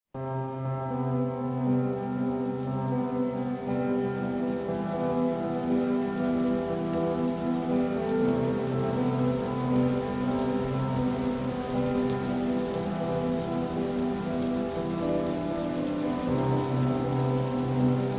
slowed rain effect

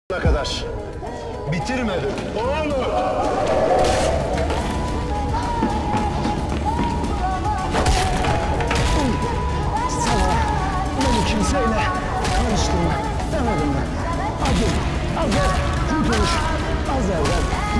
beat; cehennem; cukur

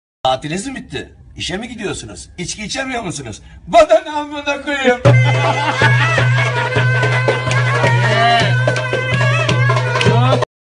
darbuka

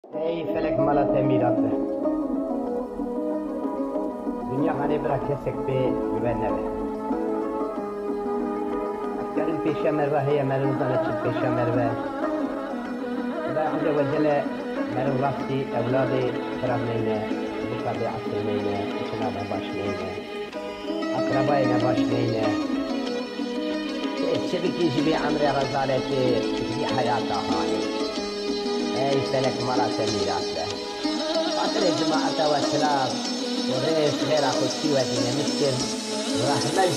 trap remix; prod by gogan music

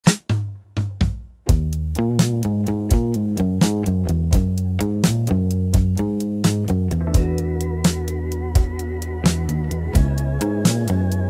psychedelic rock

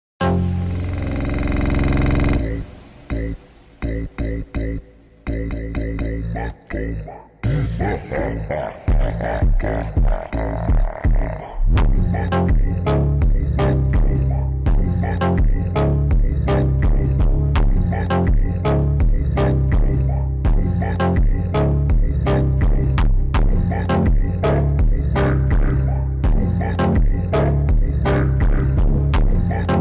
slowed reverb Brazilian phonk

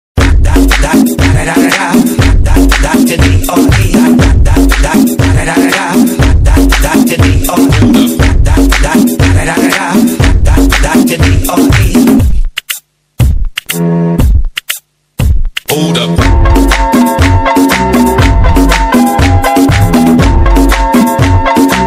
Azeri Bass Music Remix

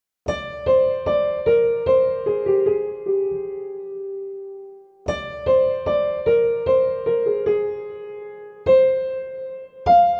piano; hercai

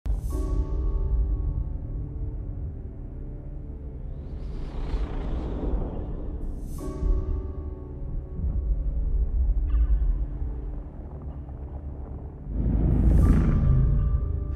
godzilla the ultimate roar

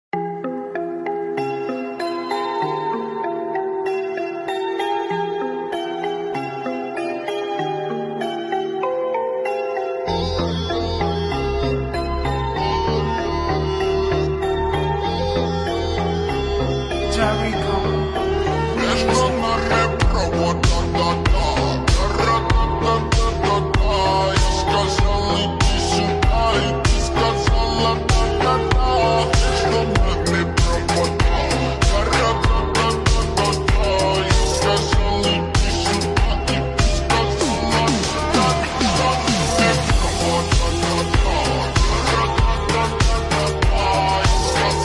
slowed reverb noise city

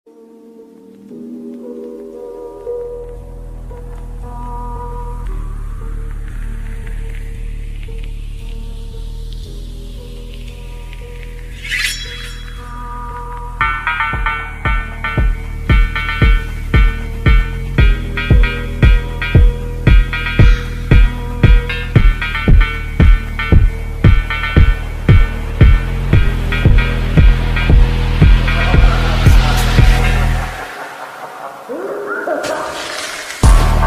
Phonk Bass Boosted

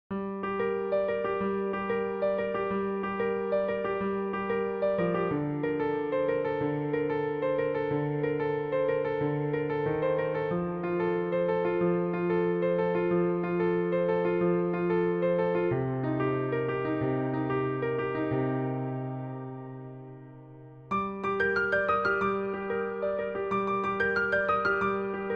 piano cover

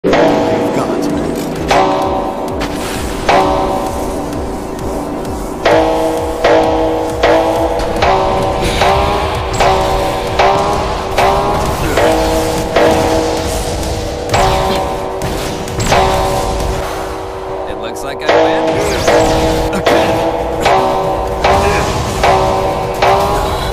slowed reverb anime phonk

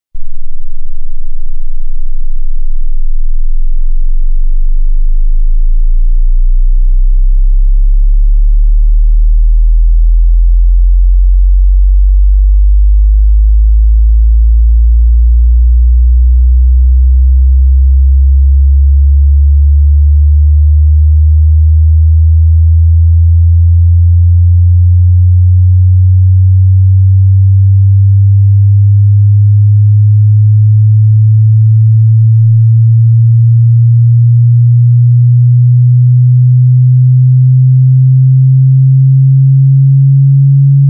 bass test 10 hz 200 hz sound only subwoofer

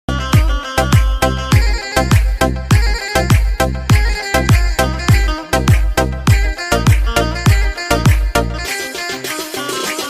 halay dabke dance

